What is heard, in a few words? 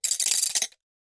Mill Clack Wooden, percussion shaker